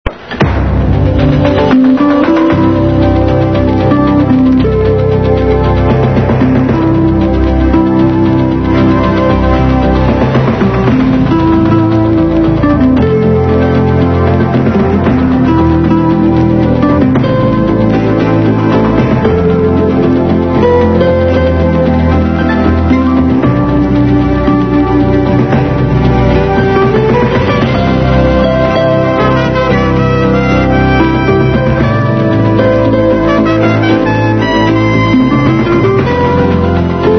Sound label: melody